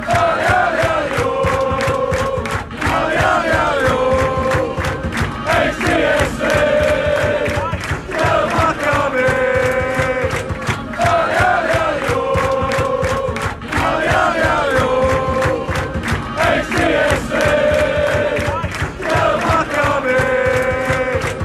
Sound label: allez allez allez oh, fan chants